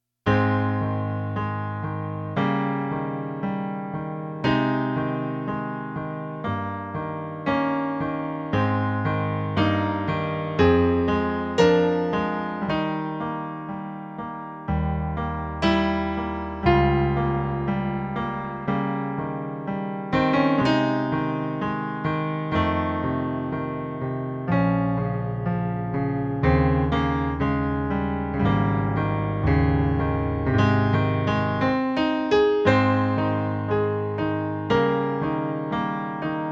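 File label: piano Beethoven Pathetique movement 2; Jeffrey Nowmos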